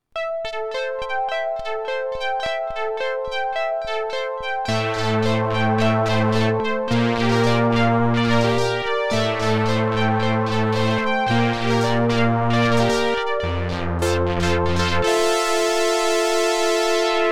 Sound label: sid poly